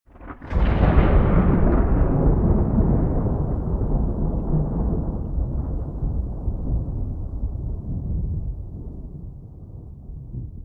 generic thunder oneshot distant

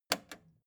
record player repeat jump out click slow

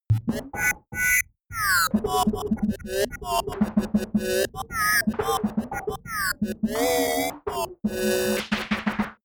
sequence crazy noise percussion lead unstable

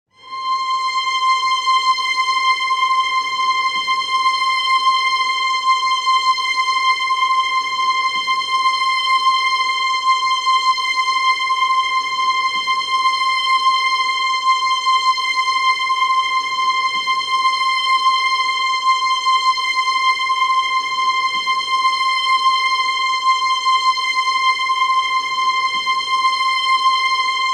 string tone high and continuous

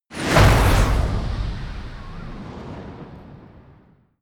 missile fly

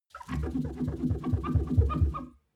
clean window paper towel rub

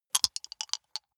big two rattle in hand closed short

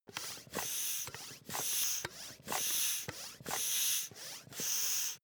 air pump ball sequence fast

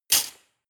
cutlery large drawer place hard

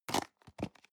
plastic box food small close hard

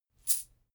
chain mail armor movement

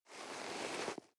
nylon leather soft

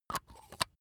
razor battery compartment close

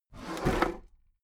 desk drawer open heavy

